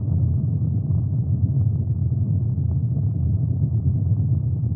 under, ambient tones

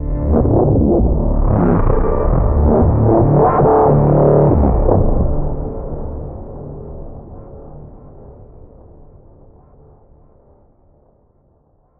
vault warp, music; Half Life Alyx

